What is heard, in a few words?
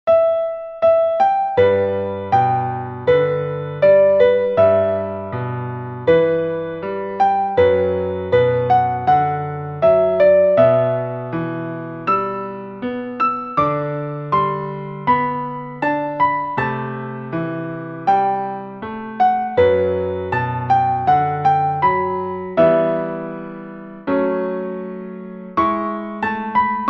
piano